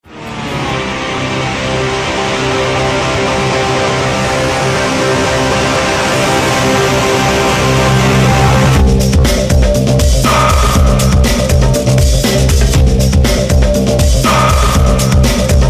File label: Driving